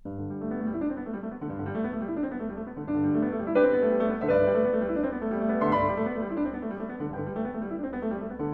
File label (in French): ch sol